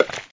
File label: Pop